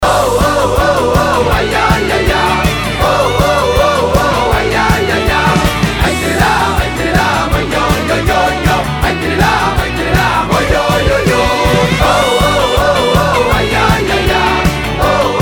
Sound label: oooooo ayayayay